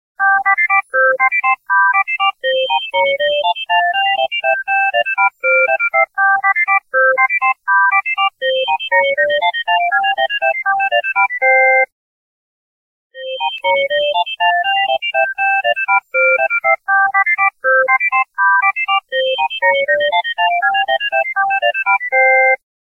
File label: Alarm Clock H T C